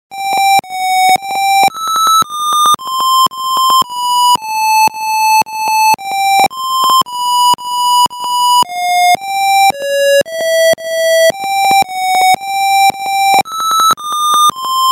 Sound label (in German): sieg; snail against squirrel